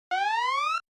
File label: Warning Siren High Single